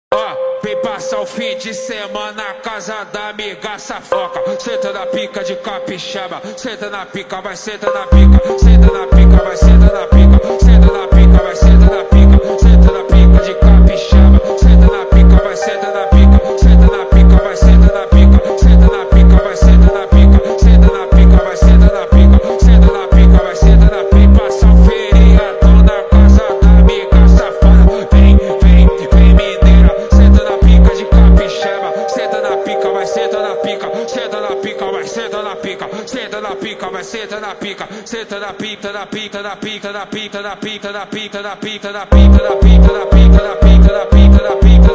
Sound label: Phonk MONTAGEM Sped Up